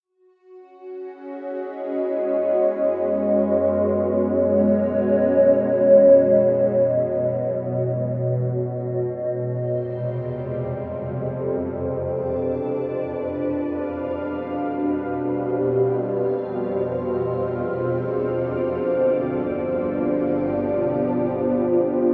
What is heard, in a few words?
blossom pad burst; daily samples